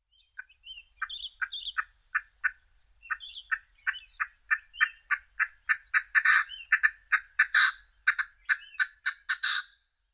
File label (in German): Rothuhn; vogelwelt suden frankreichs